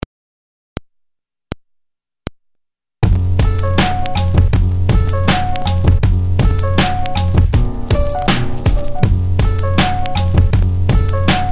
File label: Beat